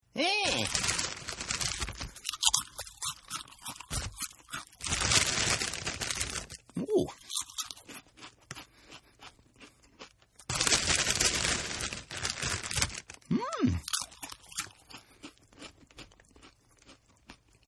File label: Chomp Cartoon Style, eating; Eating Sound Effects; Human